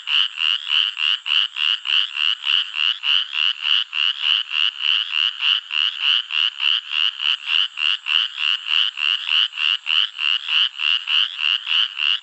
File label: P clarkii above water